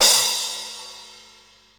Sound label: XE8 CRSH; Crypton - DrumBase5000